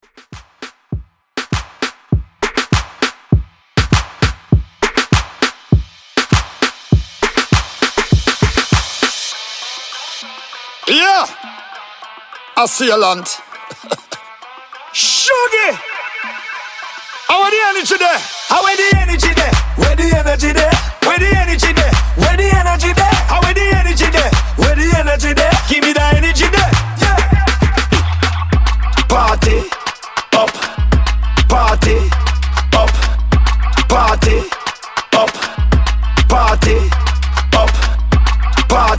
Dancehall 100 Bpm

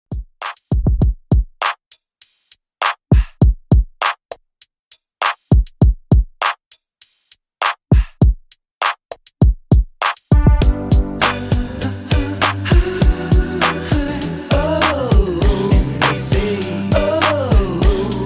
Redrum 100 Bpm Clean

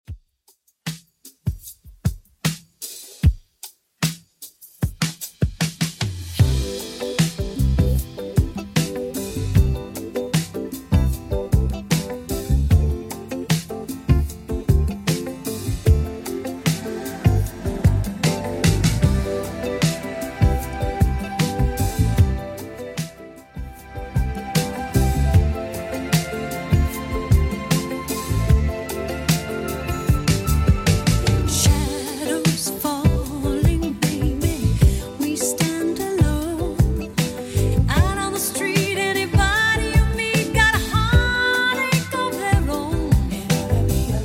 80s Redrum 76bpm

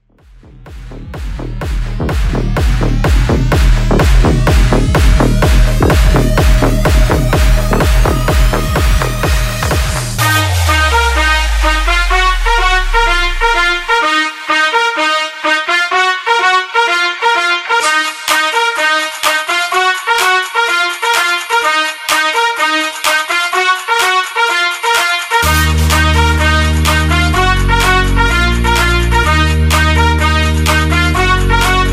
Bass House

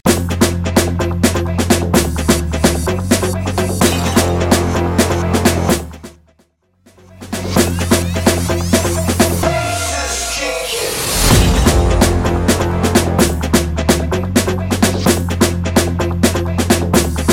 EDM Club 128 Bpm; Vegas Edit

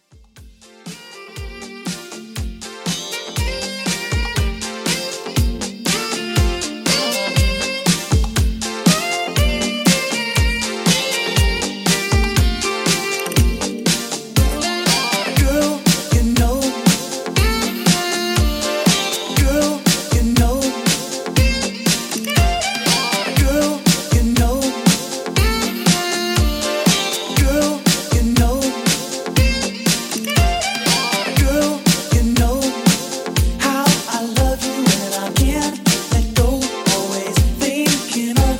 80s Redrum 120Bpm

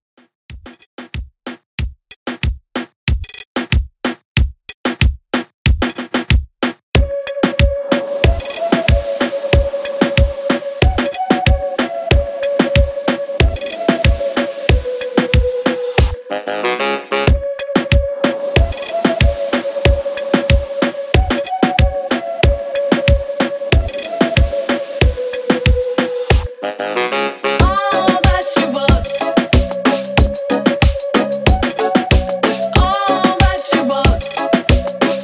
90s Redrum 93 Bpm

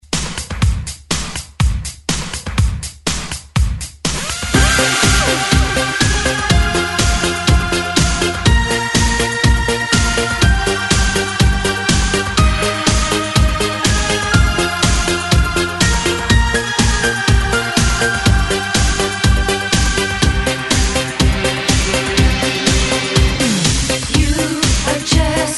90s Dance ReDrum 122 Bpm

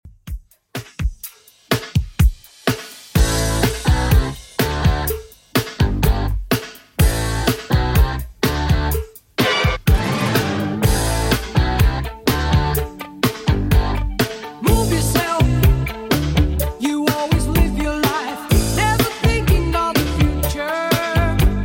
80s Redrum 125bpm

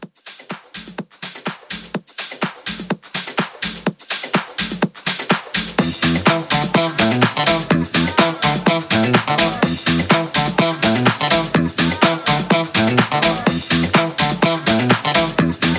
House Music

